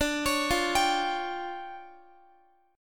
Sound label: D Minor Major 11th, down, Guitar; DmM11 arpeggiated; JGuitar